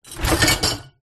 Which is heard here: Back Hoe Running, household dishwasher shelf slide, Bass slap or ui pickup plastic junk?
household dishwasher shelf slide